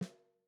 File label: SNARE LOW, drum; t series drum pack